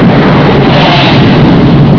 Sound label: naval guns